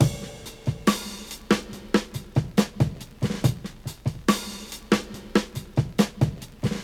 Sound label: 70 bpm drum beat c key